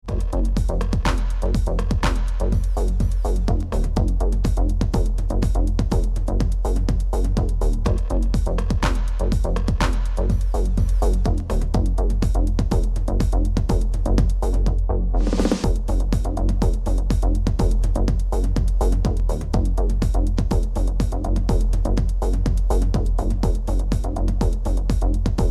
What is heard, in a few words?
bassline; House, Acid House